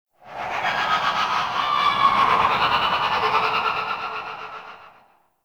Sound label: Void Connector Shriek One Off; Warframe; Citrine's Last Wish